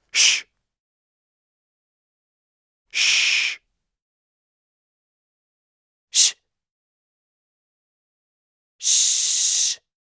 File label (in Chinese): 嘘声